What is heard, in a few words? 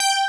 FUNKY LD BS, DANCE; Pro-Rec - Synthsonic; Z1 DANCE